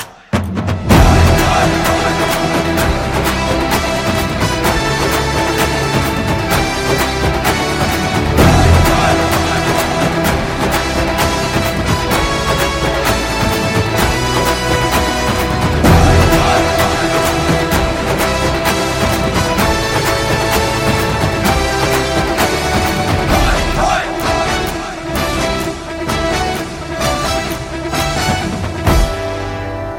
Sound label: Ringtone